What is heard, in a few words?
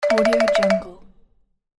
comical cute kids animation